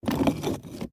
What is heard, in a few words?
Whirlpool; Blind Quest - The Frost Demon